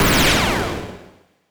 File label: Gun